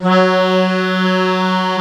tuba loopnote

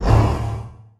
poweroff

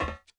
metal footstep

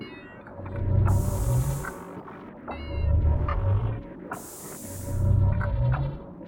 spiderbot idle, vehicles

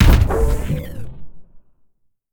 spiderbot jump, vehicles

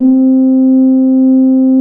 tuba loopnote

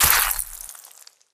gib splat

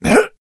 jump

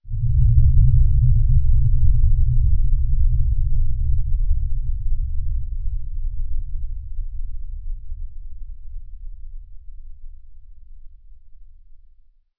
Thrumble, supernatural

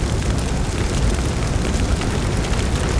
lava

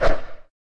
weapon switch

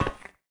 metal footstep

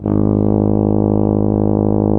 tuba loopnote

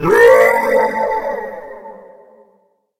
falling, player

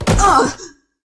fall

